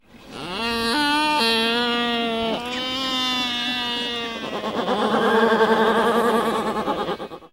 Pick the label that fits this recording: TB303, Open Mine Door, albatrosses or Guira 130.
albatrosses